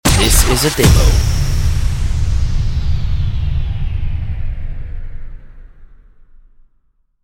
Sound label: Swoosh Crash Boom